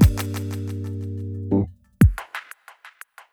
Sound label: Echoed Drum Boops; Drums; BB 2HTC Samples Vol 4 Addendum